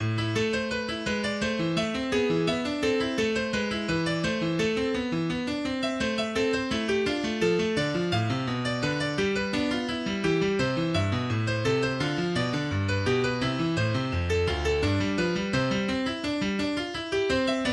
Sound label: Piano